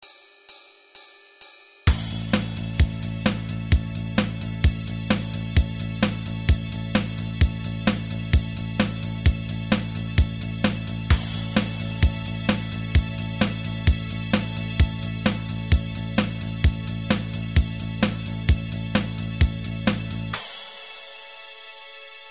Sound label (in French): 130bpm, aller retour